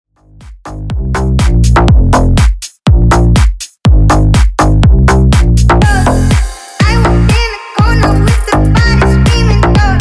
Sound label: Top40 Bass House 122bpm